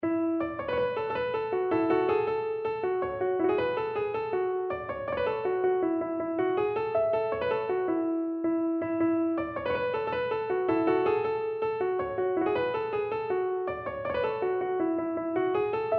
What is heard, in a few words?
Jig; original compositions volume 2